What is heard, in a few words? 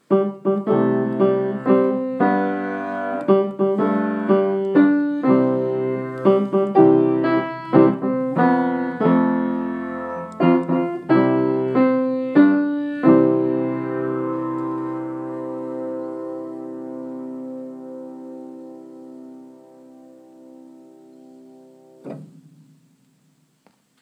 happy birthday piano